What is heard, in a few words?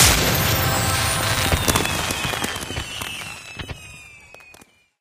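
matchmaking medal gold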